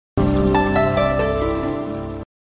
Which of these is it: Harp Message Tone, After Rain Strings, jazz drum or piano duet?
Harp Message Tone